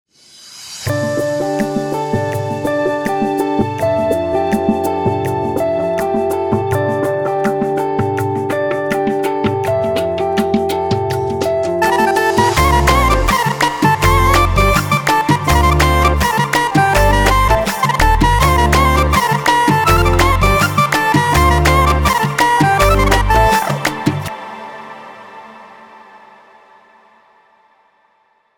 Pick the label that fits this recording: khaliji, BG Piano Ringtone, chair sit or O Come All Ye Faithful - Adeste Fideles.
khaliji